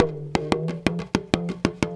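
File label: small tama groove; Best Service; World Colours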